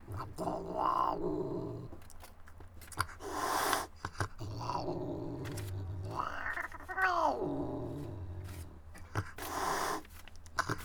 Cat Angry Hissing

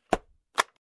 Case click opening

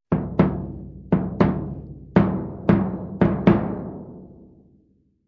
Low dull drum rhythm

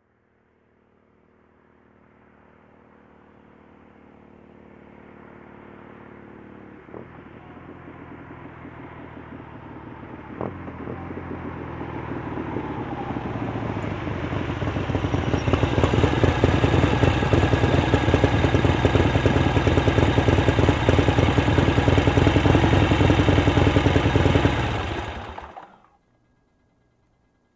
Motorcycle approaching and parking

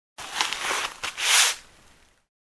Blood Pressure cuff handling

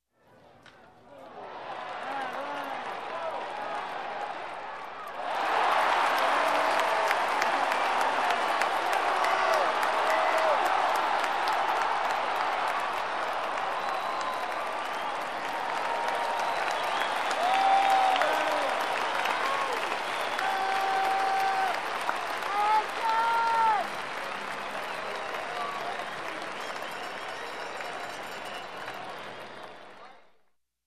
Audience in a game cheering and applauding

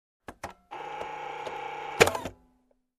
Navigating a Camera Projector

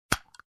Plastic lid close

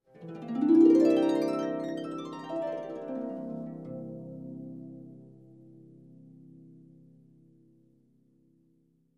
Soft Harp up down glissando

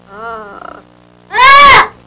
Parrot Squawk

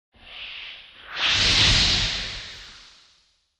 Airy fire light up whoosh

